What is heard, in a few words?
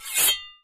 SWORD SHING, swords